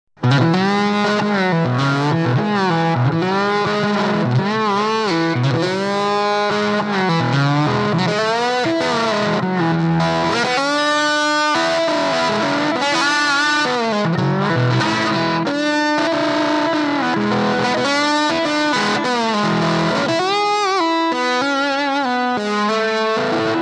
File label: Lapsteel; space tone